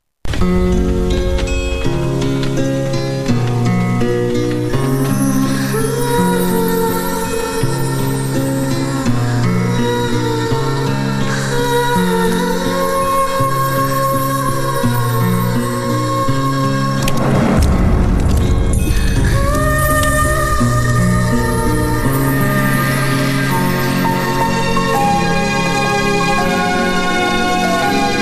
lovemusic

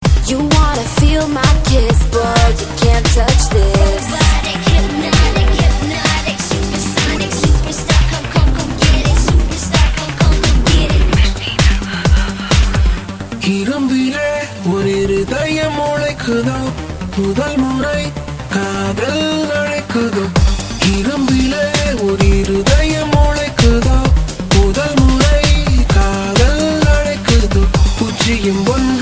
Ringtone; Endhiran

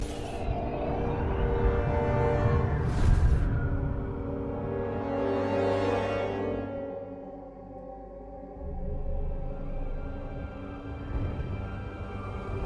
Trilling Ring Tones

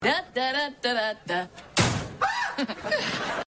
locker slam

